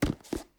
Objects, Container, Plastic Lunch Box, Squeaky Plastic, Handle, Grab; DeltaVR; FMOD